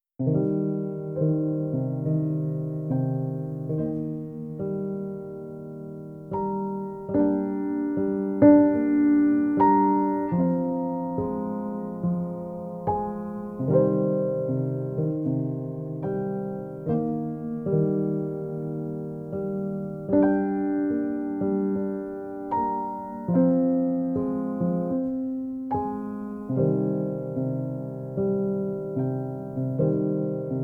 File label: Raven, Piano Preludes